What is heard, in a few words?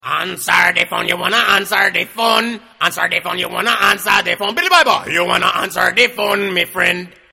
Reggae Ringtone